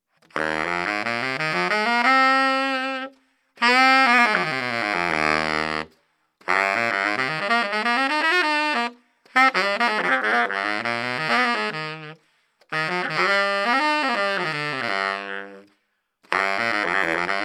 Jazz Metal Bari

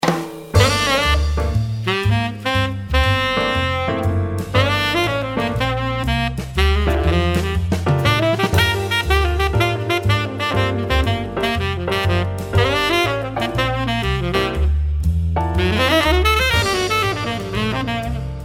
Tenor Jazz; gottsu

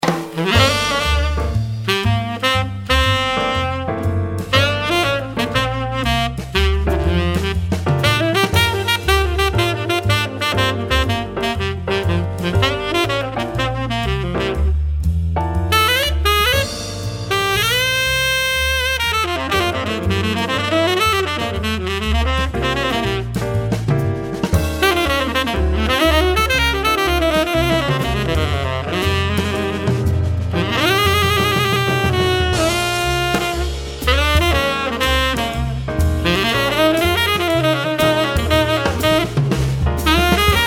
Jazz Metal Tenor

